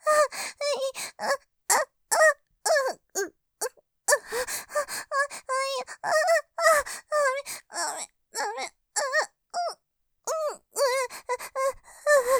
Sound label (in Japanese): 喘ぎ; さらに声が上ずっちゃう・ピストンセックス; ループで楽しむ！シェフの気まぐれ抜き抜きコース; モノラル